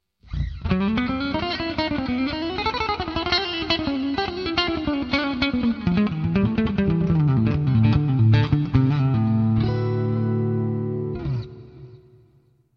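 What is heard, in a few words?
jazz soloing, guitar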